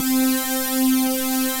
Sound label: TECHNO; Pro-Rec - Synthsonic; Z1 TECHNO